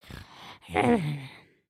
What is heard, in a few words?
Sleep, creatures organic; Lego; Strombie